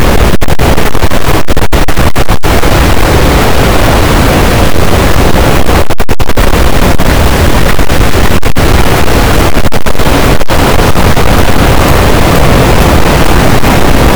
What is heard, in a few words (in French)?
cachalot, Physeter Macrocephalus, gaussian noise